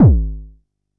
Bassdrum, Jomox Xbase09

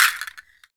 water, H20 castanet; Roland LCDP14 Africa VOL-2; Perc FX

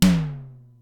Simmons SDS-9, Drum Machines; Factory B4

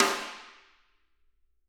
SD, Drums; Bob Clearmountain Drums I

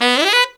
TEN BSR, Phantom Horns TENOR FX; Zero-G

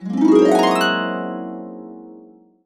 Complete Harps; USB Soundscan vol.62; AKAI

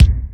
LOW BD, DRUMS; MOD.DRUMS; East West; Global Sounds

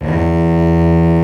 STR XBOWBA, Str Quartet, Strings

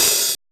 DRUMU OHH, MACH.HATS

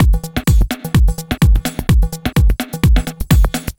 Afro Electrik, Drum Loops, 127 BPM; Sonic Foundry (Sony Creative Software); Underground UK House & Electro

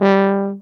BARITON; Best Service; Alpine Volksmusik

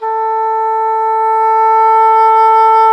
English Horn, Orchestral Winds; Roland LCDP04 Orchestral Winds; Eng Horn Swl

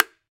BONGO F, World Percussion

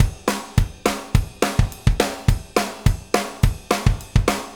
Tamla, Drum Tools Various Loops; Sonic Foundry (Sony Creative Software); Loops & Samples Drum Tools

